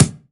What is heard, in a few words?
BD, BASS DRUMS; EdgeSounds